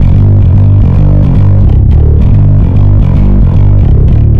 Bass, Distorted G; Bass X

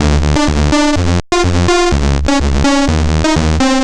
Sicklectro Bass; Sonic Foundry (Sony Creative Software); Underground UK House & Electro

